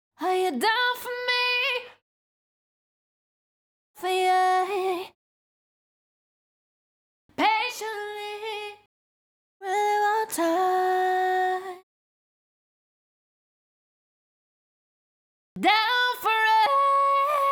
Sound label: CLEAN DUP R (F#m), Acapellas, Pop Vocals; Ghosthack; Ultimate Pop Vocals